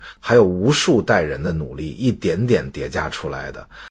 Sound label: guns audio segments